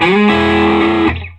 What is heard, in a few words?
SOLO E, Vintage Blues Guitar; USB Soundscan vol.22